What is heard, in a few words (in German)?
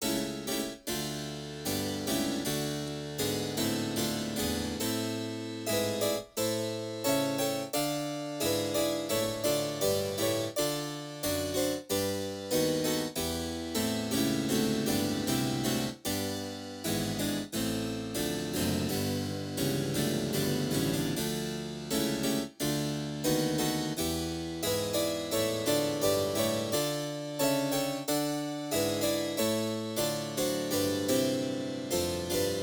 Sequenz impro